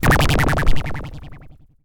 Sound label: humorous scene, anime